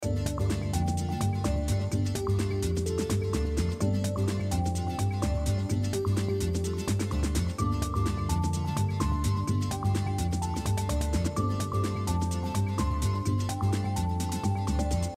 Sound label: menu stereo